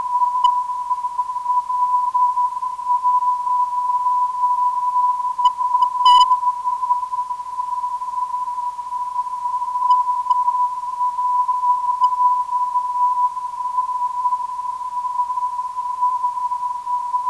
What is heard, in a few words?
419kHz, ndb; LRO